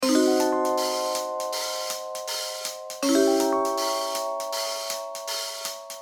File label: Short mistery